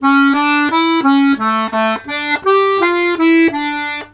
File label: Accordions; USB Soundscan vol.40; AKAI